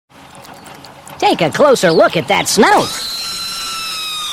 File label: reee